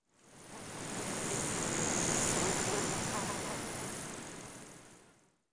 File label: CRICK, nature